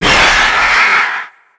pain long, antlion; creatures; vox

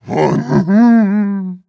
breath long, zombie

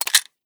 famas clip out; Counter Strike Model Pack; CS:GO

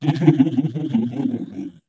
ballbot devious laugh; player; Portal 2

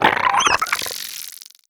idle, headcrab black, creatures; vox

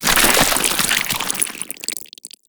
vomit splash, barnacle creatures; Half Life Alyx

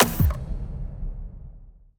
xp rank down, ui

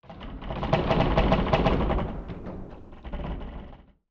bridge stress; ambient; Counter Strike Model Pack; CS:GO; survival

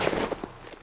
footsteps, snow; player

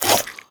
breath out, headcrab black; creatures

